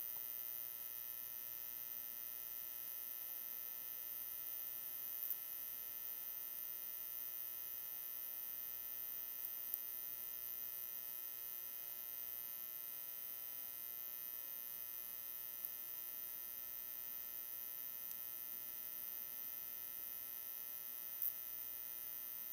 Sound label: Buzz Electric Plug Connection